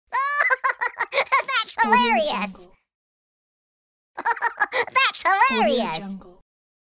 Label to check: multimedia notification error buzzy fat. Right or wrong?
Wrong